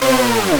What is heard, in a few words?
synth